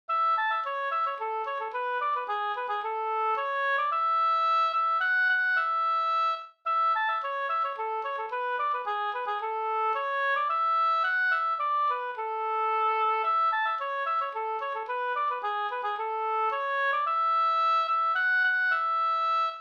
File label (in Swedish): slängpolska